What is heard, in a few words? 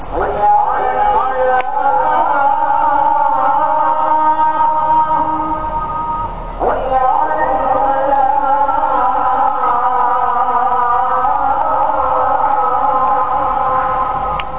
muezin; Lycia